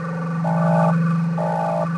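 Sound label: forcefield loop; doors elevators; Star Wars Obi-Wan; XBOX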